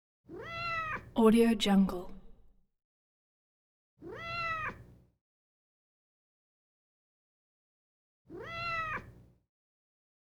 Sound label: cat meow cuddly kitten